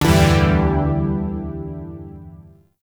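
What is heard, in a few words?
Shawzin Major Chord E; Zariman Shawzin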